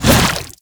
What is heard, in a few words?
Shield Swing; Shield Lancer; Warframe; Lasrian Soldiers; Weapons